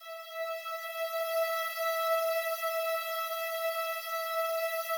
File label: slow strings E4, Yamaha VP-1; 90 s Sample CDs; Music Store